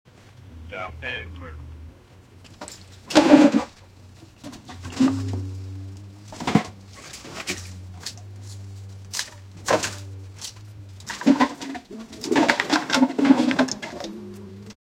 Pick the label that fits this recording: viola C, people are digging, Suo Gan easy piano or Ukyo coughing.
people are digging